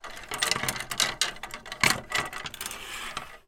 household coat hangers wooden hotel closet movement